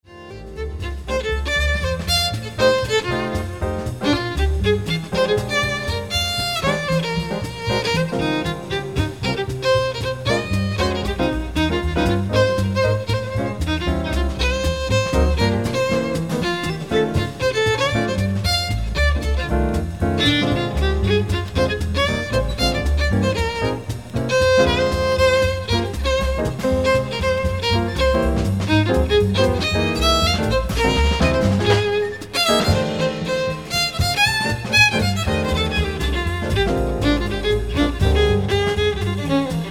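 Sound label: jazz, harp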